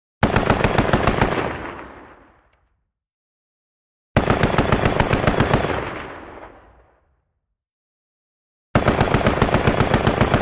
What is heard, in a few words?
.50 Caliber: Medium-long Bursts